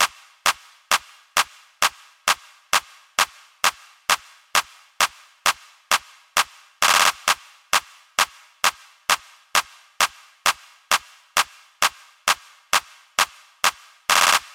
Drum Loop - 132 BPM Clap; Loop Stems; Cymatics; Vanquished Drum Loop

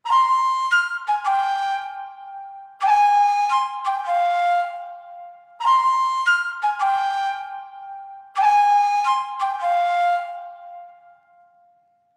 173 BPM F Min Flute, melody loop stem; Trap; Loop Stems; Empire

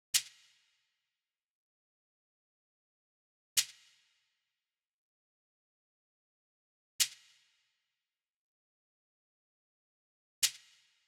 Drum Loop 140 BPM Percussion; Cymatics; Mystery Pack Vol 4; Sturdy Drum Loop

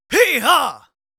Yells, Viking Vocal

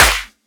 Main Clap; Dubstep; Claps; Drums; One Shots

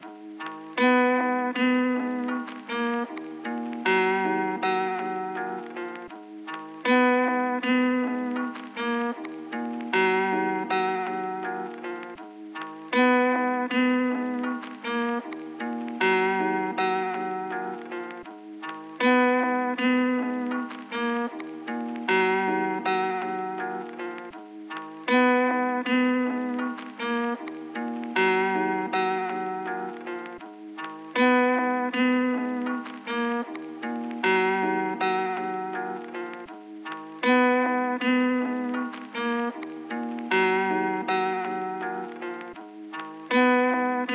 158 BPM D# Min Aged Guitar; Loop Stems; Cymatics; Fill Up My Mind

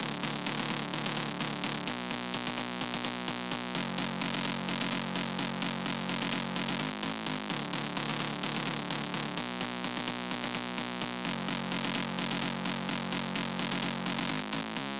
128 BPM F# Min Bass; House; Drop Loops; Cymatics; Mothership

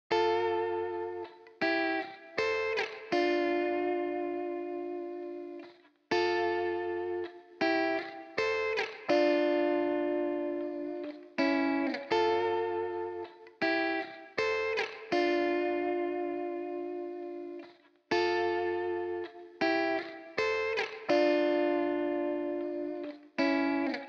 80 BPM D Min Guitar Harmonies; Loop Stems; Cymatics; Miami Guitar Pack - Pt 1

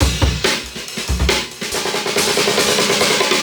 Riddim Drum Fill 140; Ghosthack; Riddim Essentials Volume 2

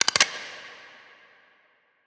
Freeze, Guns

